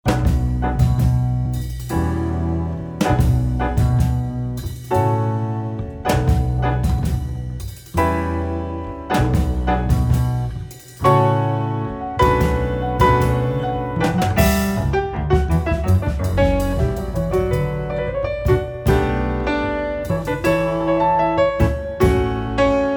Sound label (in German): Gavotte h-moll